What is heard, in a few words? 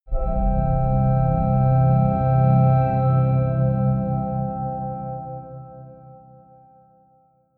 organ swell major short